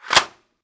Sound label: famas mag in, weapons